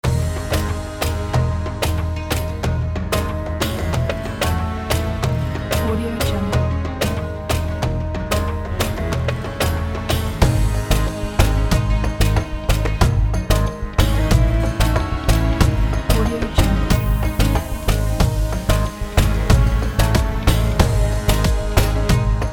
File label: in middle east